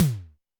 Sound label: Tom Ol Hex, Alesis D4